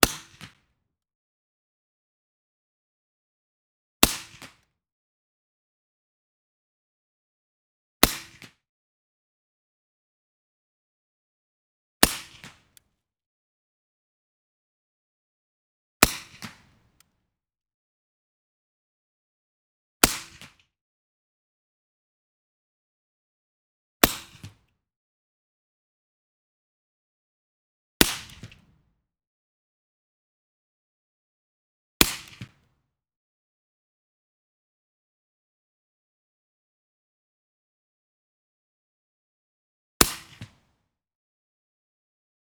De Lisle Carbine .45ACP suppressed right Single shots; 1m; MKH8040